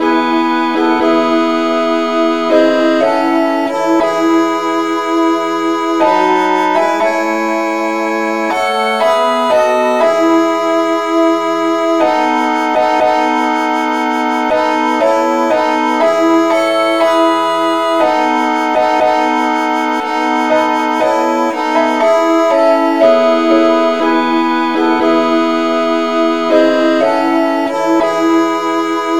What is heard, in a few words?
jspm satb key a